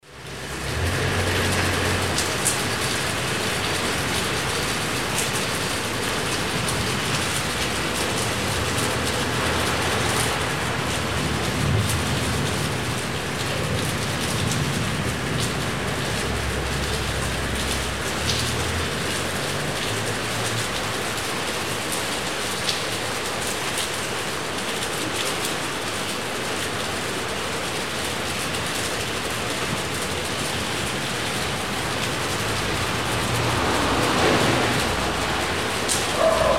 rain city atmo

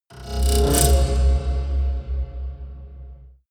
haunted strings roll deep

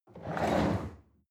chair wheel roll on floor hard squeak

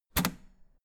old button harsh ringing push

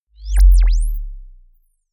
bass drop sizzle soft

